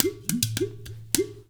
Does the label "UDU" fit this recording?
Yes